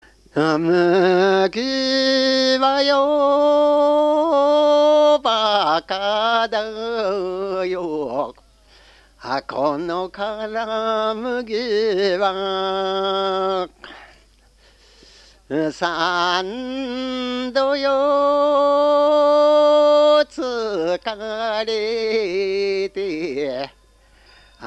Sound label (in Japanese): mugitukiuta, minyou